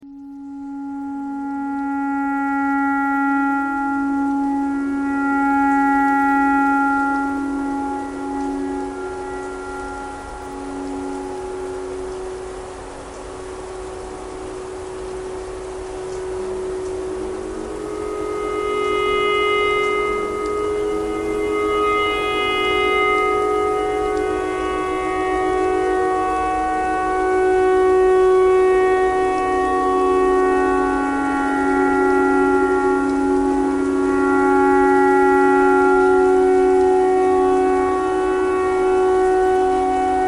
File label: tornado siren clarinet simple